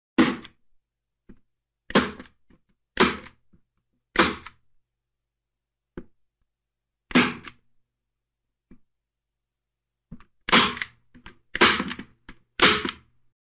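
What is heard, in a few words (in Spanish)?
SONIDO DE ENGRAPADORA neumática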